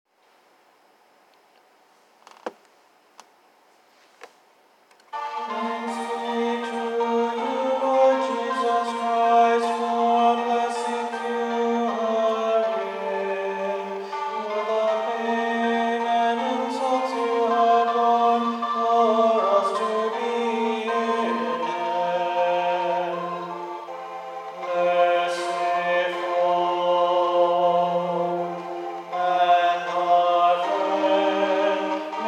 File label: tenor with sab midi